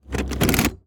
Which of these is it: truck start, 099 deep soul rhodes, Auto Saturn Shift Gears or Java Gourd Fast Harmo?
Auto Saturn Shift Gears